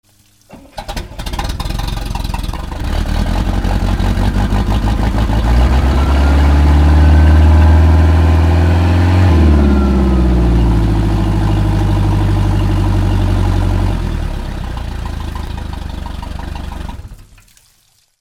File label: BOATS